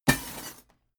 impact chain leather

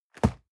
box hit medium

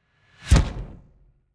incoming glitch

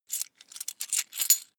coins big clatter

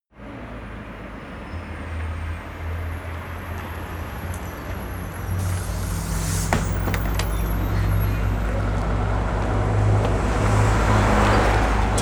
bus ride

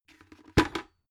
bucket empty handling put down

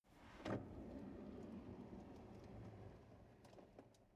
drawer medium close draw long

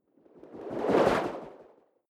whoosh simple flutter futuristic

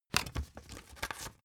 cd cover booklet out

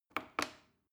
plastic button small click regular slow

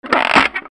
door lock

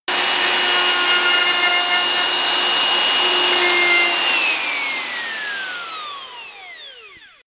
sander stop

